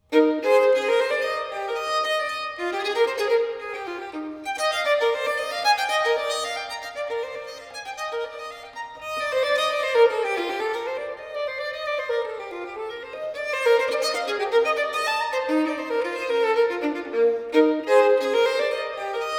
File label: Violin Partita No. 3 in E Major, Bourrée; Bach; Sonatas and Partitas; BWV 1006